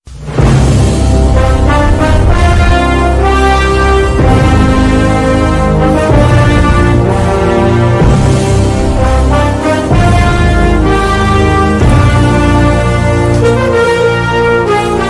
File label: interval bgm ringtone; Khaleja